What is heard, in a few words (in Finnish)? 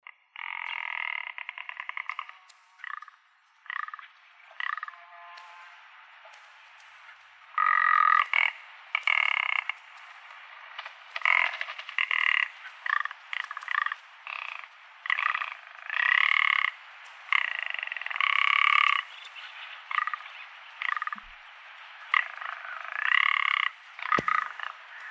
kiiruna